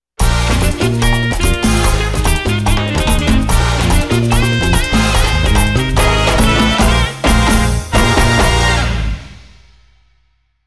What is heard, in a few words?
Minigame End; Samba de Amigo; Wii